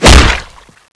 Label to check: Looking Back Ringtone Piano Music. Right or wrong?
Wrong